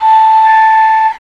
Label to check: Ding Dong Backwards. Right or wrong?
Wrong